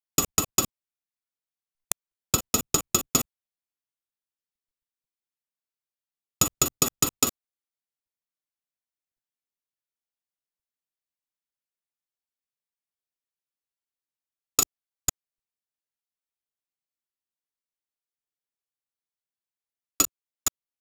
Dacia 1310 break sound, automobile

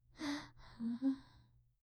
clothing, sound-effects

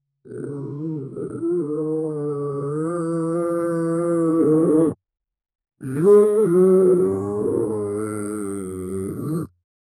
lion in pains

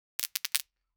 magnet falling to ground; attraction

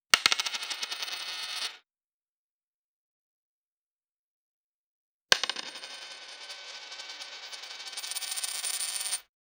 coin getting collected li, collectible

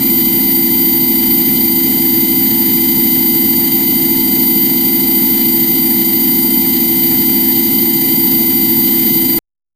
planetary gearbox small; repeatable

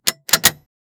metal

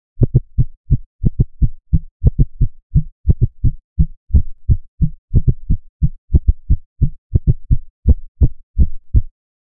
heartbeat under narration

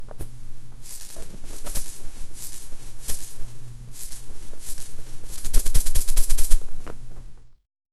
butterfly flapping wings, insect

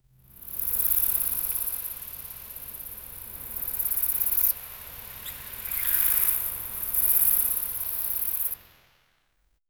soft wind at the hilltop; magical shimmer

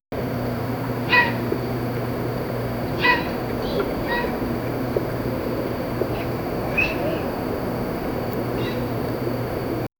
lizards and birds, caveman

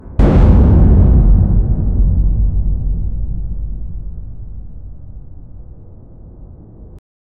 start with deep ambient; mystical

